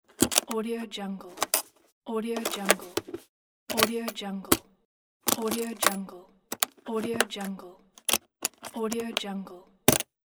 open chest lock

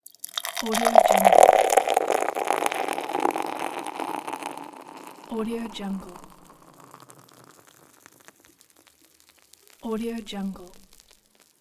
pour beer

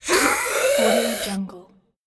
aerosol spray

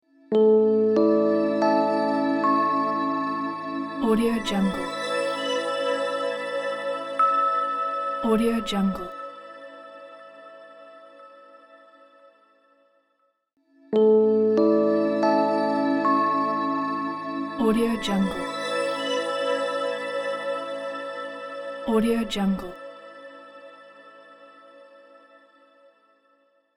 dreamy logo; monophonicspace

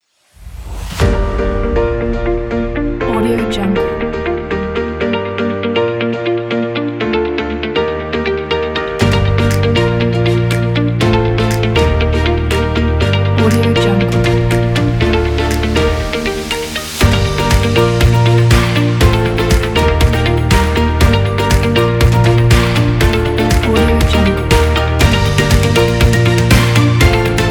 uplifting inspirational corporate

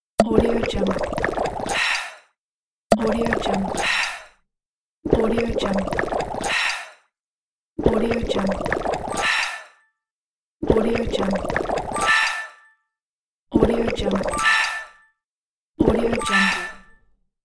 potion drinking

